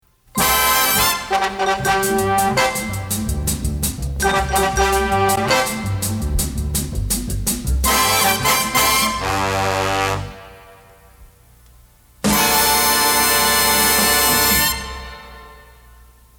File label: Inst; Swingin' Musical Aisle; Without vocals; CKWX; 1961; Anstey Collection; Jingles